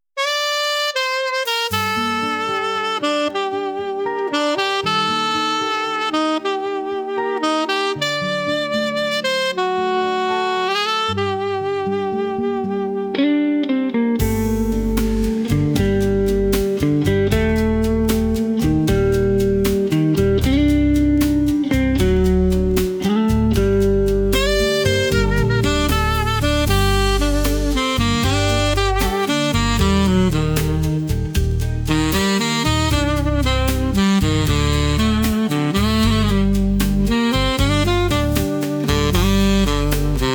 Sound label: sax serenity